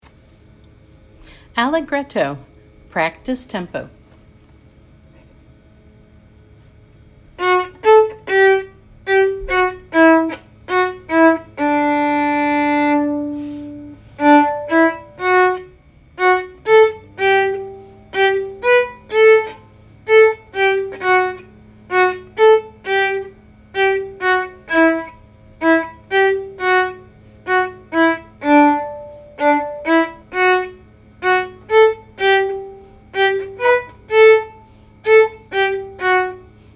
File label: Allegretto practice tempo